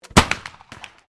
MUSKET FLINTLOCK 50 CAL AMERICAN FRONTIER REPRO FIRING; Black Powder Muskets; Gun Shots; Sound Effects:Guns